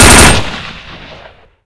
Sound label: famas burst